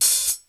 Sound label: hihat, house; Crypton - DrumBase5000; HOUSE #1